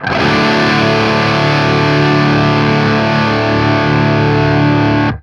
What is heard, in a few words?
open D, open chords, guitar; East West; Steve Stevens Guitar Samples Collection